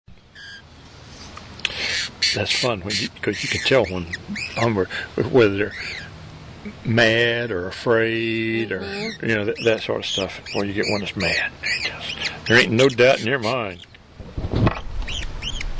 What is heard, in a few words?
ANGRY HUMMINGBIRD